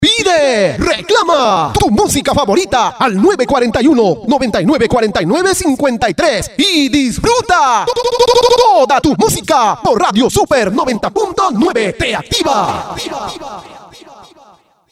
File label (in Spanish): rompecorte; pide tu musica